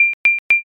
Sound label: microwave